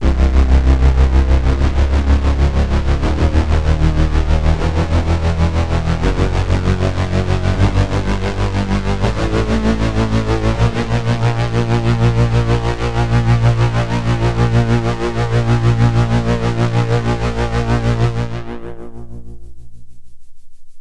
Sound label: octa scale devtronix